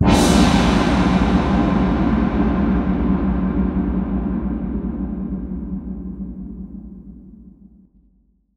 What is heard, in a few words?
Crash, impact samples; Crashes